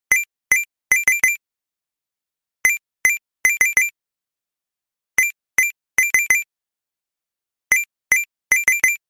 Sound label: Game Coin